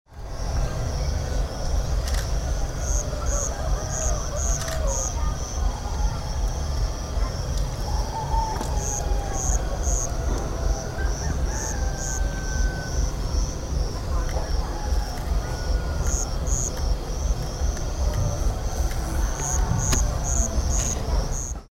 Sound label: ambient; gulu